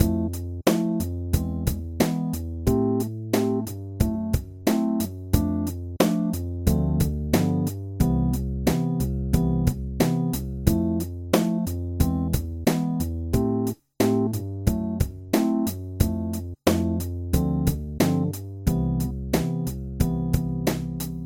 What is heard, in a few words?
chord